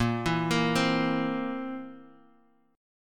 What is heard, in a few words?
Bb Suspended 2nd Flat 5th Bb down Guitar Half Step Down